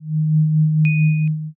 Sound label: final tension